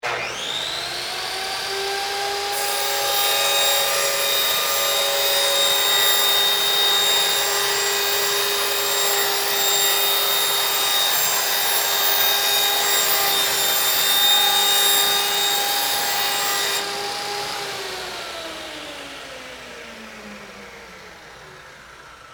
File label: Panel Saw Plywood, Rip, Sheet; Industry; Saws; Machines; BlastWave